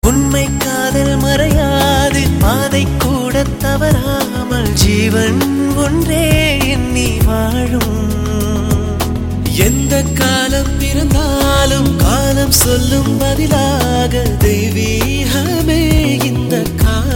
Cute Flute

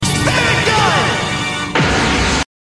Gun; Spirit

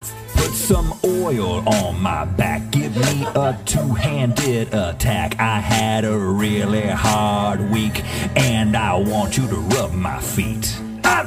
Ambient massage song

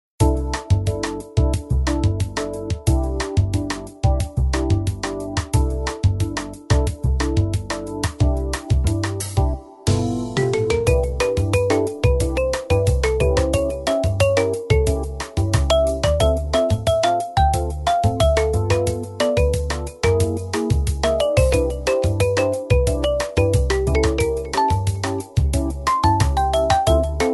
Breeze

